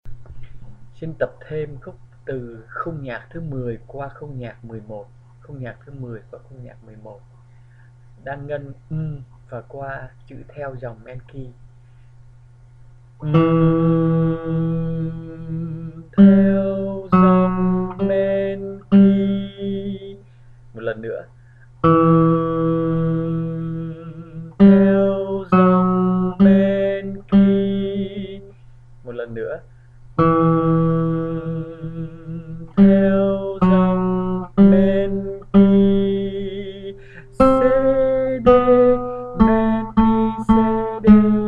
Soprano

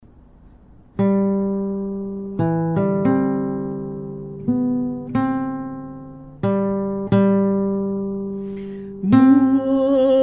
Tenor

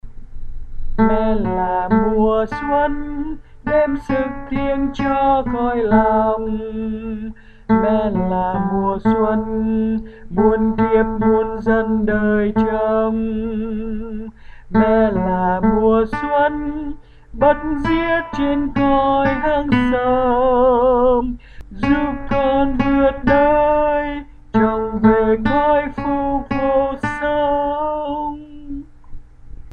Tenor

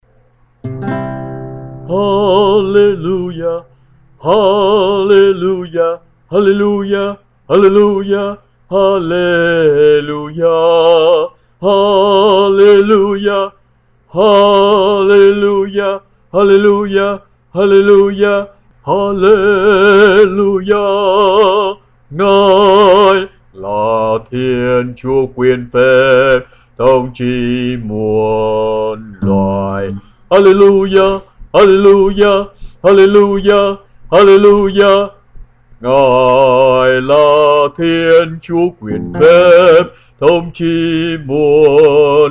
Alto

